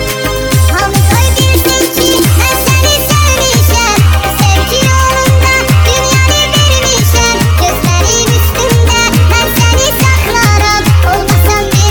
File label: remix